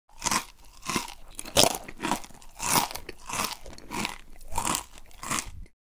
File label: Eating crackers crispy chewing